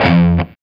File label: F#3 SOLDANO ROCK, Guitars; Spectrasonics; Hans Zimmer Guitars VOL-1